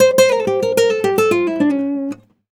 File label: Flamenco, Guitar, a